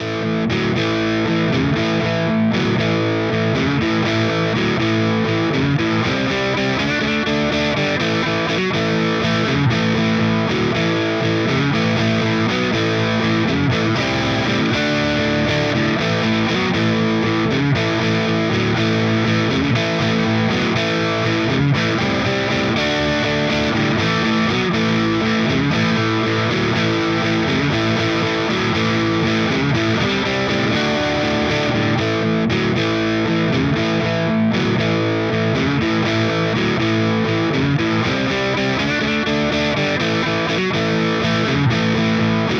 CST-24 Rock orange neck; harley benton

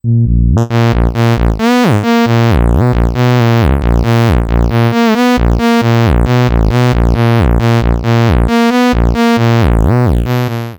Kurzweil K2700 12db bass

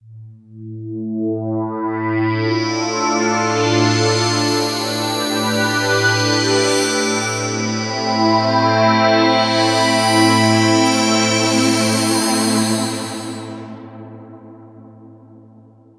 Novation Peak test glass pad

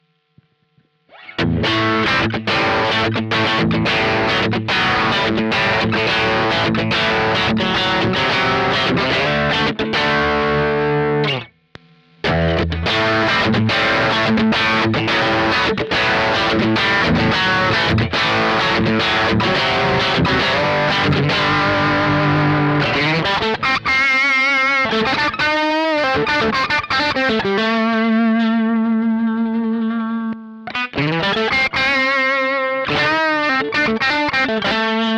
vox mv50 ac gain pedal boost

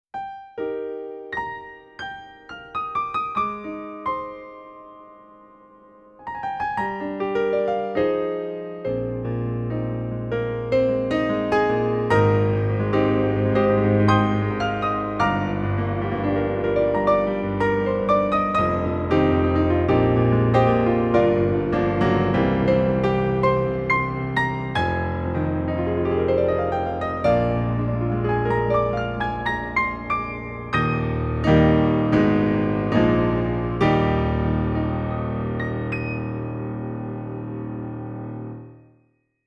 grand piano concert

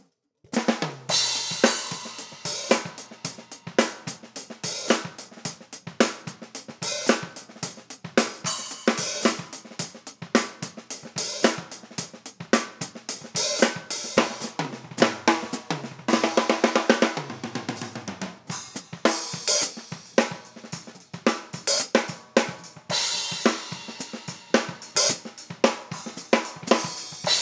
shure beta 27 hihat

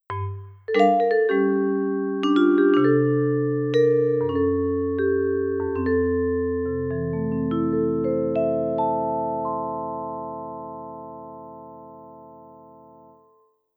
px s5000 vibraphone

